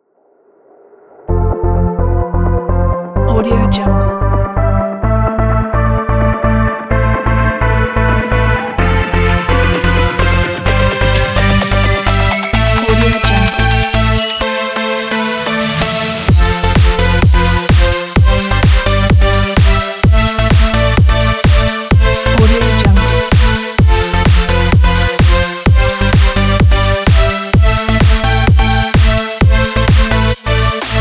the energetic dance

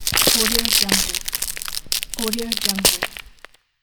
freeze sound ice magic

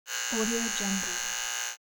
trimmer

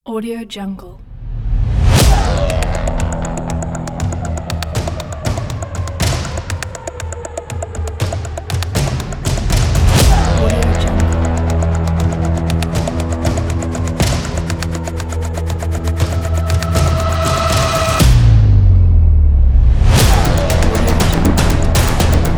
dark cinematic trailer

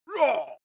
human vocal male shout hit soft

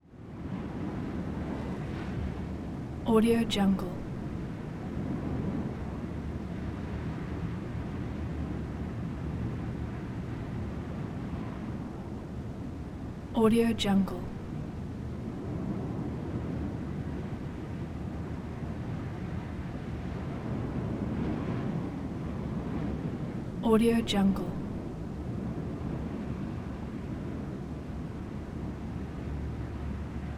wind sound

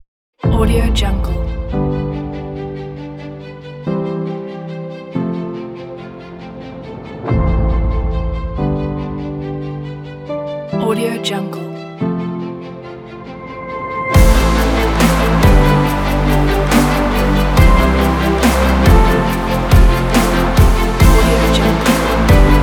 cinematic dubstep

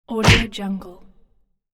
face punch hit

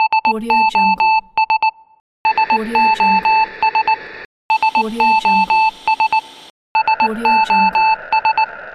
sos

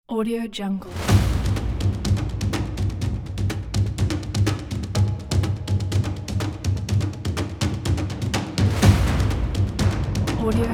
action epicness percussion; andromedasound

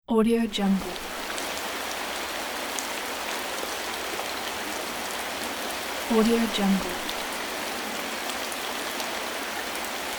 rain in the forest

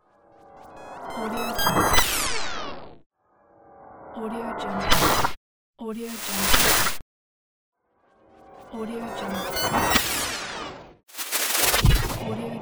boom cybermachine break down

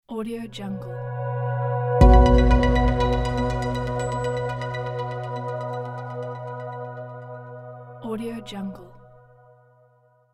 catchy logo

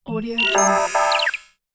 futuristic gadget

